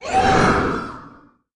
shout; moguisihou

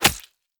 beat dagger

